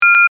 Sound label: Multimedia Beep, Technology, Multimedia, Beep; Beeps